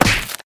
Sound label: grab